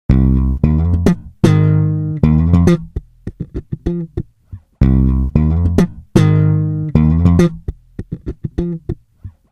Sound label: loop bass riff; WMBonusXP